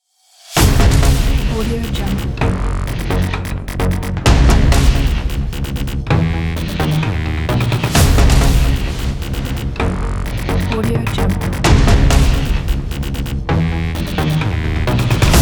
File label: logo technical factory dynamic; greentonica